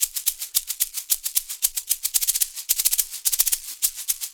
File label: Shaker, Percussion Loops; Ambient Grooves